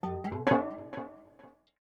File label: ethno 125; eJay